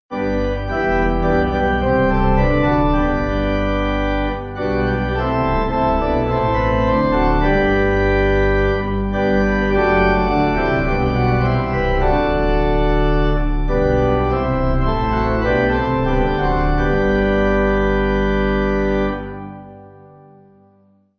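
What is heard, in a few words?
Pipe, Pipe Organ; Celeste 8.8.8.8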